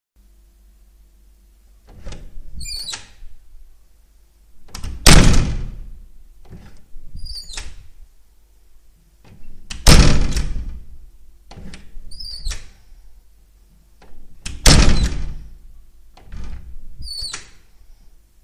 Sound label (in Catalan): Obre Tanca Porta